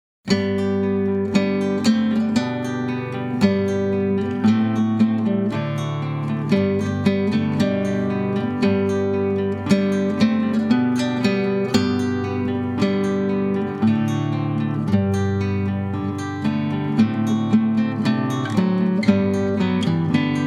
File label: tanz, Gitarre; klassisch